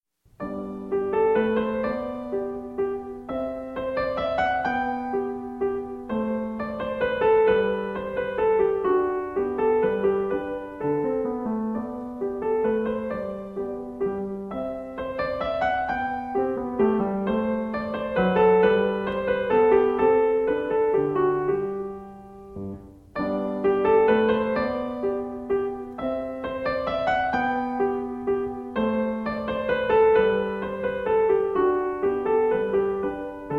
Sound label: Menuet In G Major; qn=130; Bach Notebook; no repeats